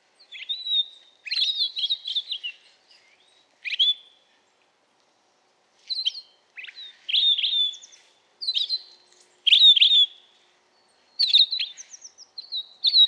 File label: Pinicola enucleator; Xtallbit